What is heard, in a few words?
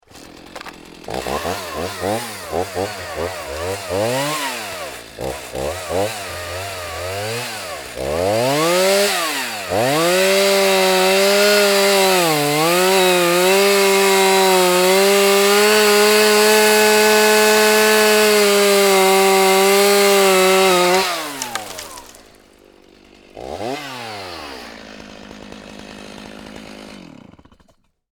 Chainsaw Cut Tree, Industry, Chainsaw, Cut, Small, Tree, Fall, Sequence; Saws; Blast Wave